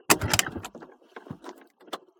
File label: Scooter Kicker, Piaggio Sfera 50cc; SoundBits; Scooter Sfx; ’94 Piaggio Sfera 50cc